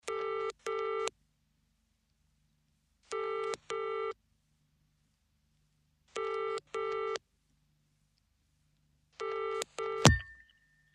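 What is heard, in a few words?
British Tel Ring Tone Ans; Foreign Futz Touch Tone Rings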